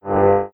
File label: Moowarn; tempest 4000